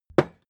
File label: hammer wood hard